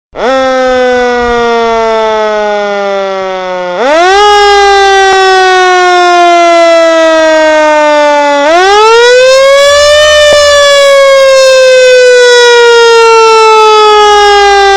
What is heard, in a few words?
Fire Truck Ringtone, Siren Ringtone